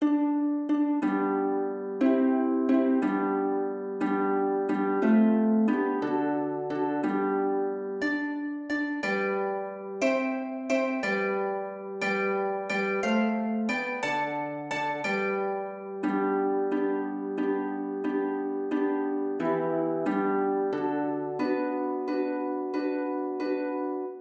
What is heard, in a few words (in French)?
HARPE